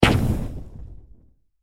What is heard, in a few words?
attack